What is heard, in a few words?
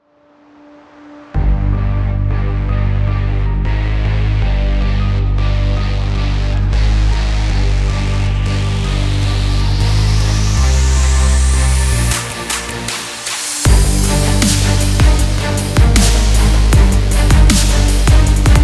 Drive Sport